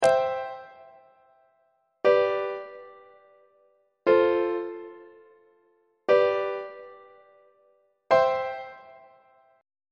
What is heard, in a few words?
b sharp major perfect authentic cadence on treble clef